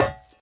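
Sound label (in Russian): негромкий удар по металлу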